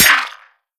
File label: npc charger shield, bullet impacts; physics; Half Life Model Pack